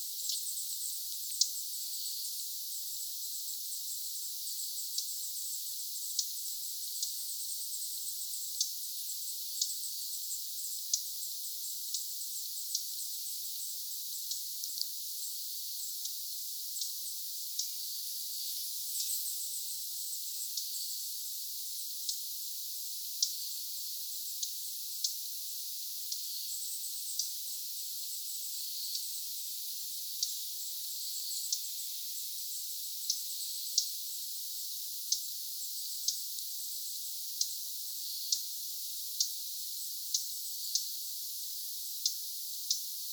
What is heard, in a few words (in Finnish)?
ilmeisesti hernekerttulintu huomioääntelyä hippiäisparvi; hernekerttunuori huomioääntelee?; hippiäisiä